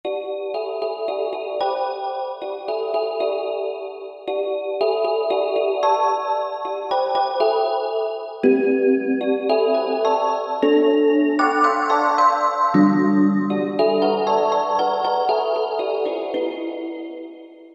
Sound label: Asian Chord; World; Roland SR-JV80-05